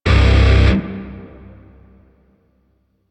Shawzin Blues Chord A; Grineer; DE; Warframe

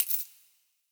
Ur Coin Drop; UI; Minigames

